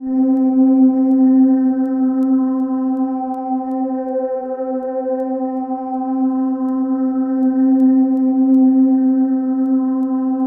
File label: C3, Synth Leads